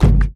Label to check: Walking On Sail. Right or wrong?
Wrong